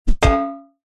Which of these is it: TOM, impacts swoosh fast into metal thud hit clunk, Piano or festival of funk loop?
impacts swoosh fast into metal thud hit clunk